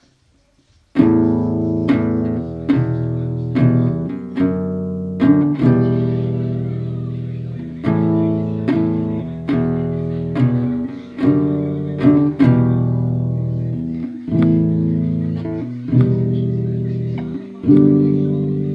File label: guitar play by me